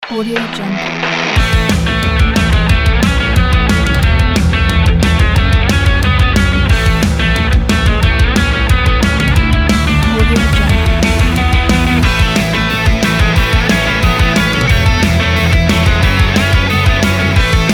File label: powerful punk rock